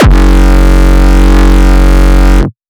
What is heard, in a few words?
frenchcore, kick